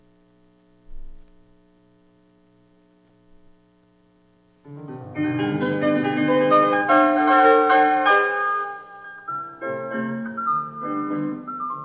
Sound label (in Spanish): danza; Ondina